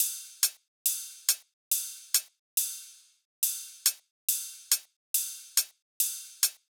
Riddim Drum Loop 140 Top; Ghosthack; Riddim Essentials Volume 2; Full Drum Loops

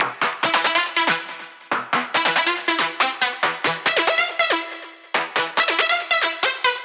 Riddim Melodic Loop E 140 Crumble; Ghosthack; Riddim Essentials Volume 2

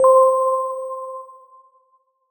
BELL (C); Melodic One Shots; Gems

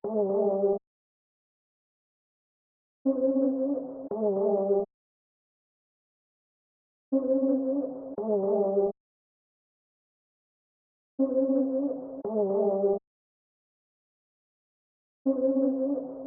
118 BPM G Min Vocals, melody loop stem; Trap; Loop Stems; Cymatics; 2022 Melody Collection; Trap Pt 2; Panama

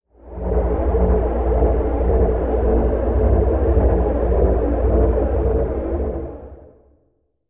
peculiar atmo

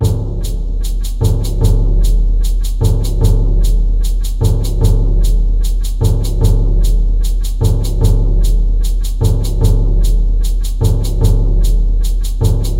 Low Drum Shaker - 150BPM; Percussion Kits; STEMS; Ghosthack; SkyRealm; Kit 02 - No Where To Hide

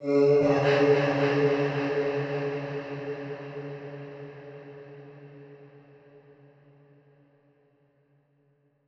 110bpm E, Vocalpack

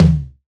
Saturated Synth Tom - E; Cymatics; PHARAOH Beta Pack; Pharaoh Premium Drum Samples (BETA); Toms

